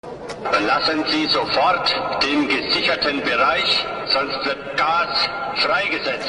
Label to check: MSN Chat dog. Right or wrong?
Wrong